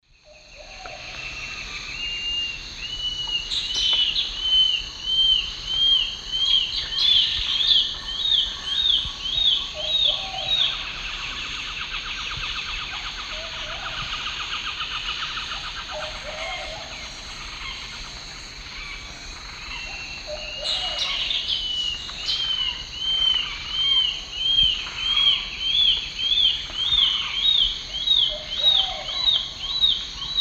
Myrmotherula menetriesii, song, S. America Eastern Lowlands